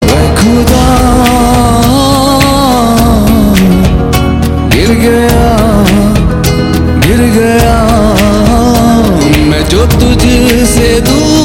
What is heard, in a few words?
Soft